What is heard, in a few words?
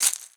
MARACAS; Xsample VOL-9